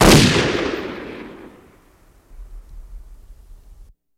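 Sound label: Gun Shot Single Shot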